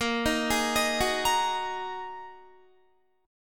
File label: Bb 7th Suspended 4th Sharp 5th Bb Guitar 4F,4A,5C,5G,5C,6E; Bb7sus4#5 arpeggiated; JGuitar